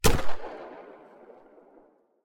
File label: revolver single fire; ranged weapons guns; psfx